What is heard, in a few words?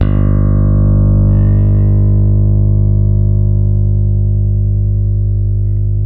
A 2, compresence, bass; Spectrasonics; Bass Legends